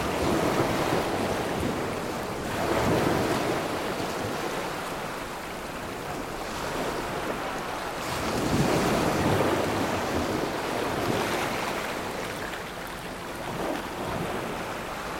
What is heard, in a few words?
waves